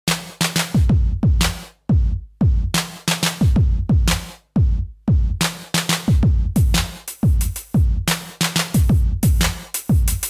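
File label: SE70 Gated Reverb 80ies Drums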